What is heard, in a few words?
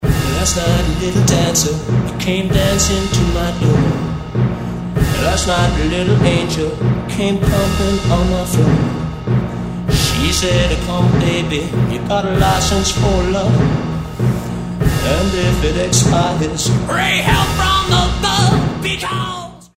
Buglers Yell